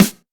Snare, PsyTrance

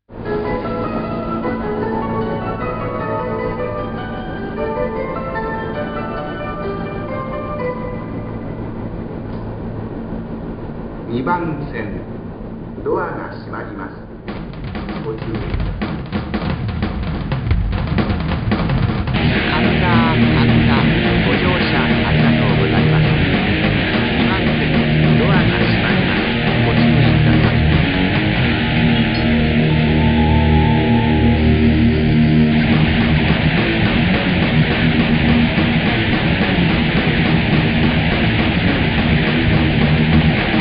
Heavy Metal Train

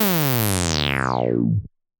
Zap, PsyTrance

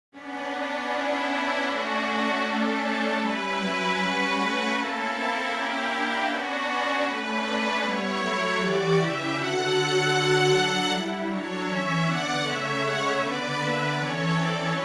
instrumental

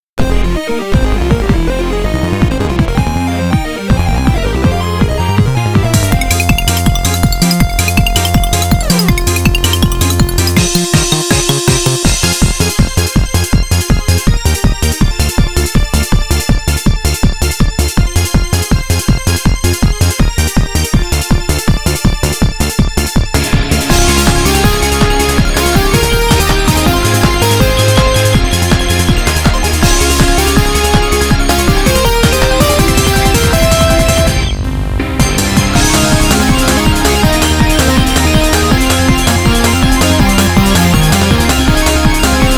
Off Vocal; 例大祭7

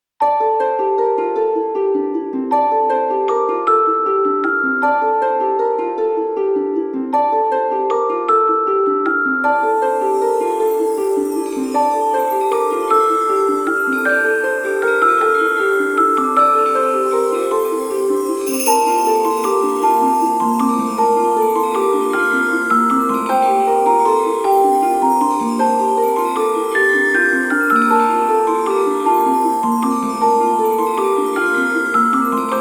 instrumental; 例大祭10

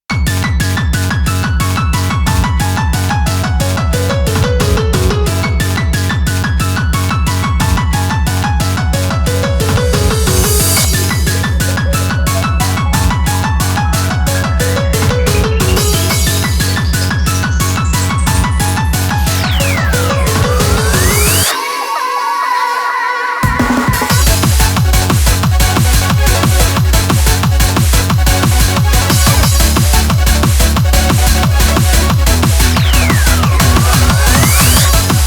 raging waves, Instrumental

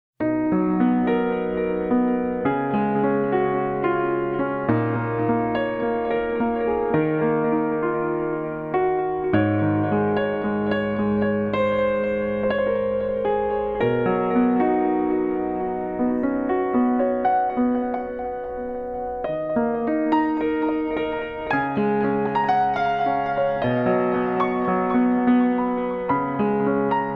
Piano ver.

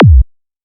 Kick; LiLA'c Records PsyTrance Sounds 01

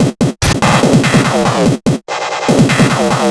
FILL, PsyTrance

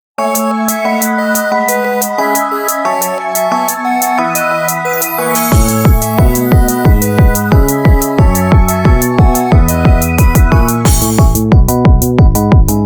instrumental

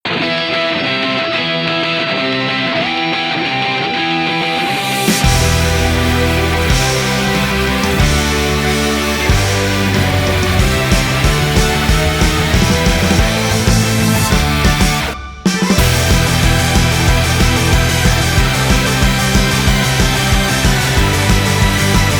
instrumental

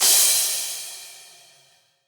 Cymbal, PsyTrance

